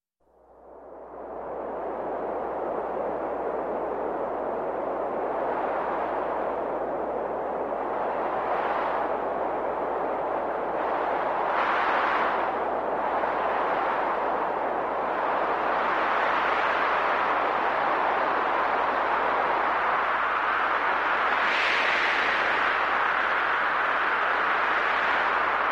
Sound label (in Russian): voy vetra perehodyaschiy v svist; zvuki vetra; zvuki prirody